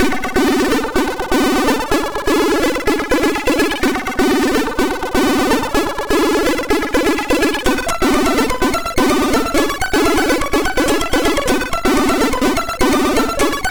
Latino, MOS6581R3

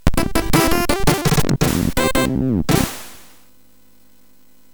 Score, MOS6581

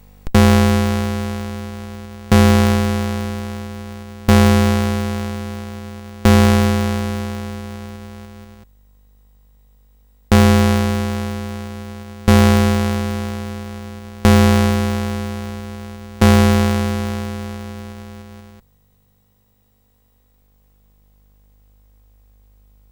Ding Dong Bells, CSG8580R5

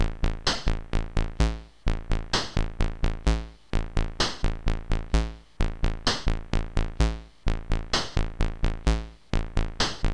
Drum Demo, MOS6581R3; Karz

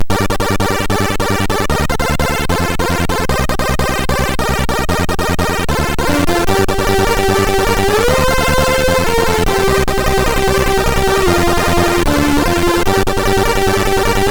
Chip Arp, MOS6581R3

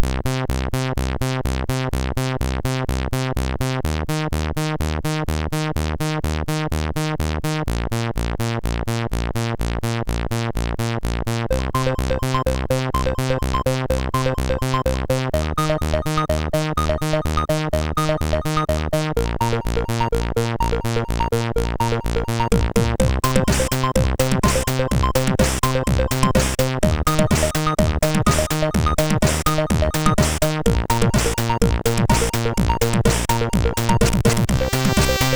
Shuttle X, sid MOS6581R3

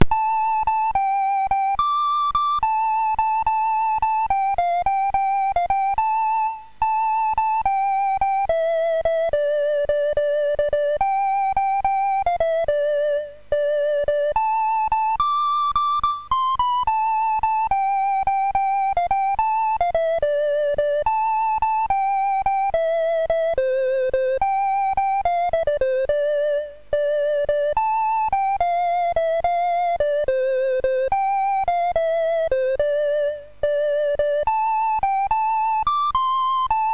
Melodia, MOS6581R4

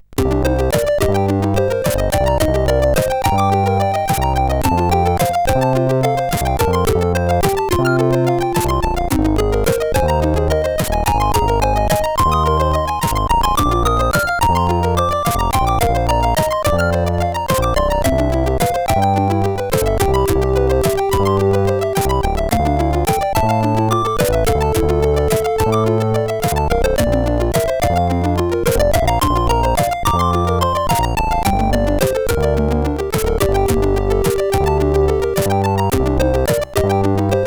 Rise and Sine, CSG8580R5; Wijnhoven Joachim

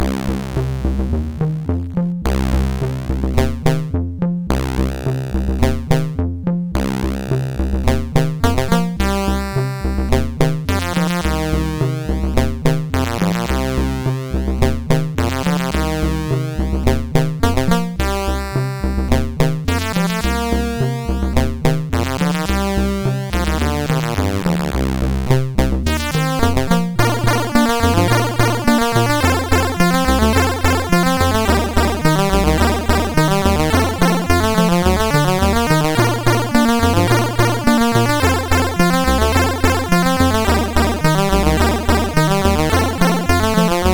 disk version MOS6581R4; sid

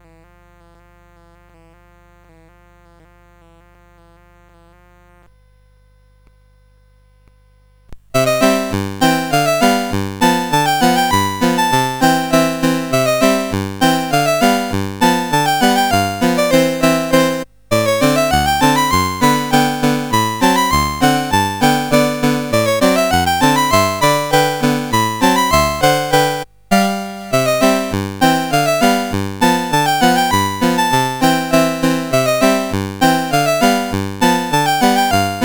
Polka, CSG8580; Unita Polka

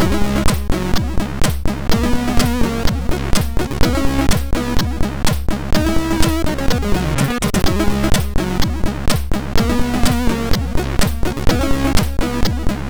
Music Test, MOS6581R3; Music Test 2

